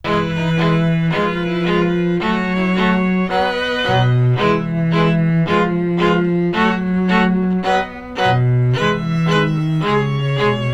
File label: solo